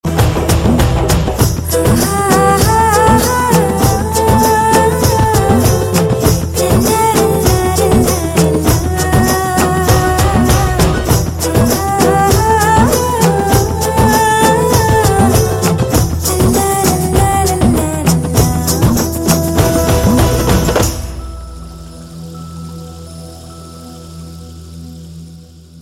nanban music

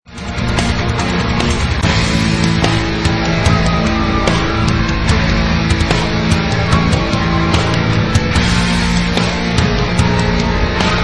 heavy metal

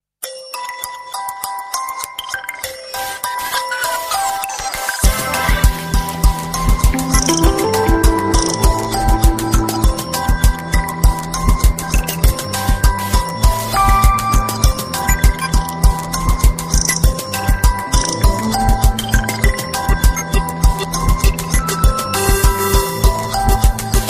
Resonant; Symbian

